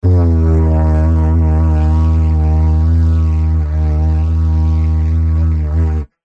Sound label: foley blowing into plastic pipe vibrate resonated didgeridoo like